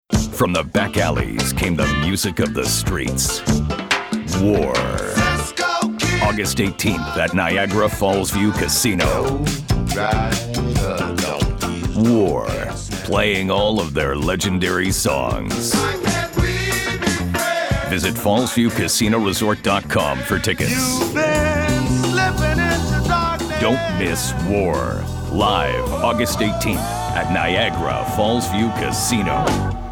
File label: FUNK WAR; voice artist